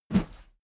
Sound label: whoosh heavy low; swooshes-swishes